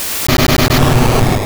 Dynamic Punch; sfx; attack moves; pokemon; gen 2; gsc

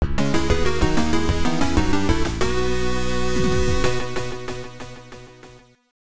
Clear Mission; Black Bird Tori Tachi no Tooboe; PC-9801

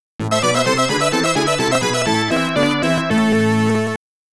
BGM Game Over; Castlevania; Akumajo Dracula

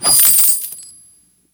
Coindrop Big; Angry Birds

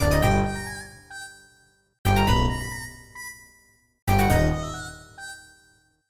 Bonus Items; Bricks of Egypt; macOS; Windows

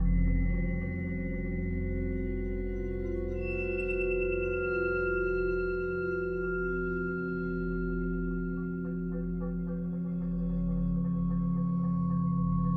Loop Dream; the walking dead michonne

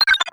Skip Line

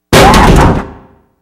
Bathroom Crashing Sound; silent hill 2

